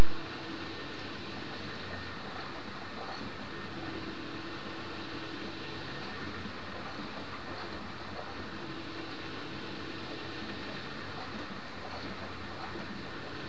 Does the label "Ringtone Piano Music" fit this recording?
No